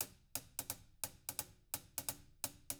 HIHAT LOOPS, Living Drums

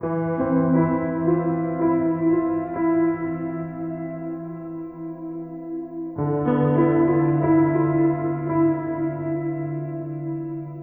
Piano FX; Sonic Foundry (Sony Creative Software); Slow Silhouette

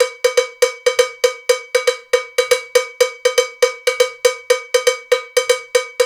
Bembe 6 8, World Dance; Spectrasonics; Supreme Beats

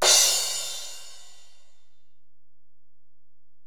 Ride, Alesis DM-PRO, Drum Machines

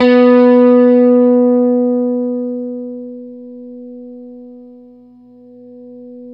STRATOCASTR

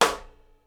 SNARE, Live Snares

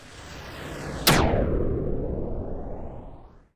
sfx sci fi; Masterbits - Switch FX

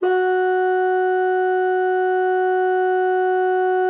bassoon, F#4; Xsample VOL-6

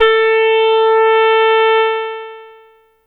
Lead Synth

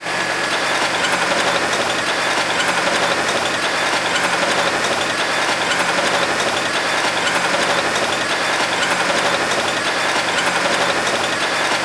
STREET MAC; MACHINES

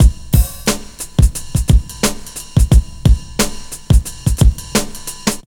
REAL, REAL DRUM LOOP; Ueberschall; Jam Box

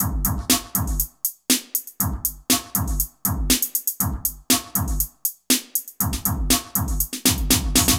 NRG Break, Trance, Drums, Breaks

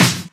Snares; Vengeance - Essential Clubsounds Vol.4

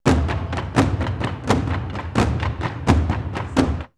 Bulawayo 128, drumloops World Ethnic; X-Static Goldmine

